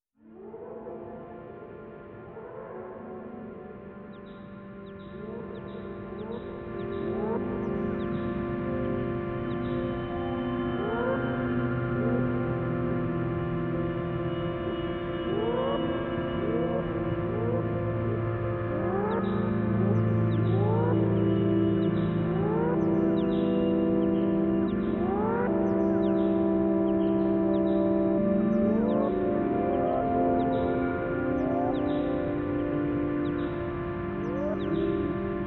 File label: birds and chopsticks